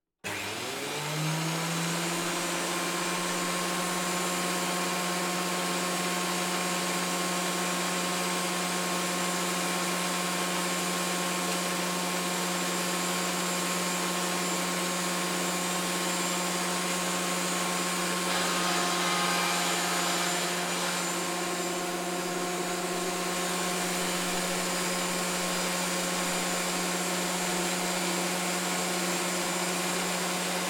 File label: Carpenter Tool Table Planer Machine Planing Wood; Carpenter's Tools; West Wolf